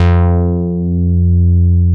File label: MOOG1 BASS F2